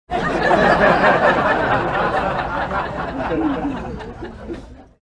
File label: laughing meme